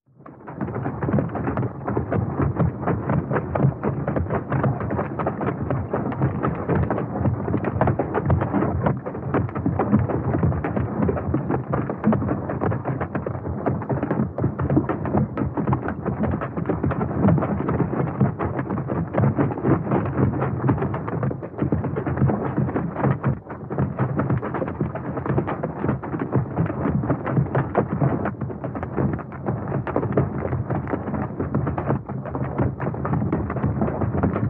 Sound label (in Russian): zvuki nosoroga